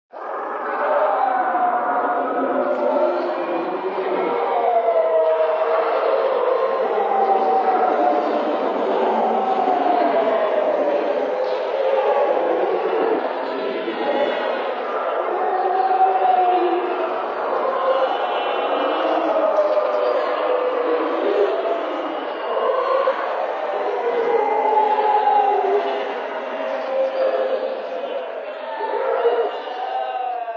Voices Hell Medium, Multiple horrible screams of souls trapped in hell; Devil Voices (Sound Effects:Horror)